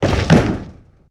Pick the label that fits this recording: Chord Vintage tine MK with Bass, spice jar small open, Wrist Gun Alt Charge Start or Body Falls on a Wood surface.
Body Falls on a Wood surface